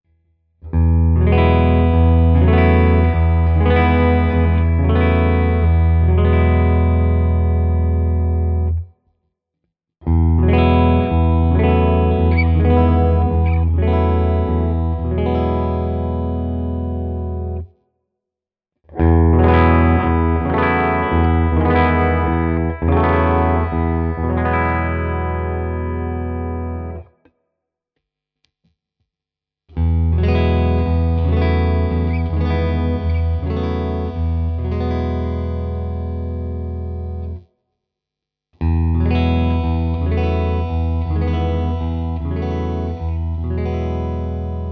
Hagstroem clean Chords all positions

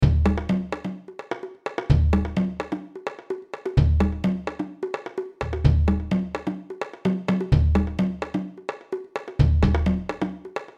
drumloop original

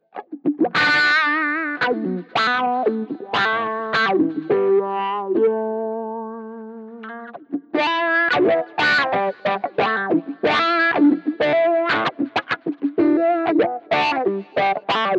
Cry Baby Crunch; Zakk Wylde

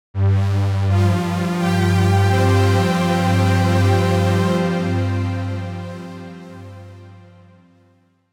Long String; Cherry Audio GX-80

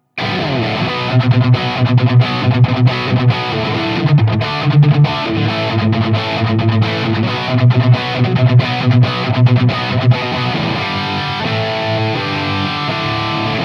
Rhythm Twin; ToneMasterPro; Fried

